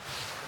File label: draw line; Epic Toon FX